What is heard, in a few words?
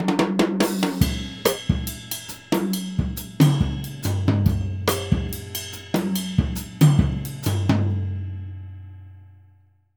Jazzistic; Univers Sons